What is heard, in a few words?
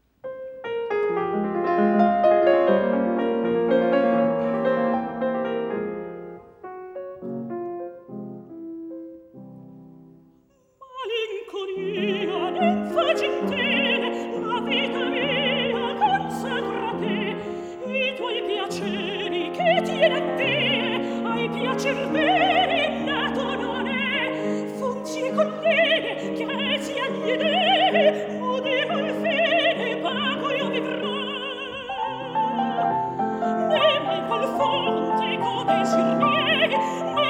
for voice & piano; Malinconia, ninfa gentile; 6 ariette da camera